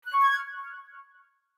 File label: Orchestral open